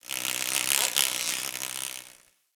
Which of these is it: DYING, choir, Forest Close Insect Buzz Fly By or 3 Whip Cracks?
Forest Close Insect Buzz Fly By